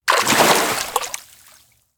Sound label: enter water; awayuki; anemoi